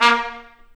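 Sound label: brass trumpet stab; Roland L-CDX-03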